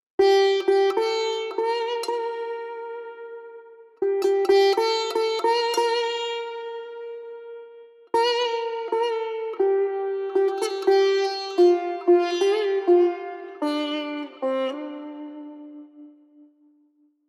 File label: Sitar outro; raji an ancient epic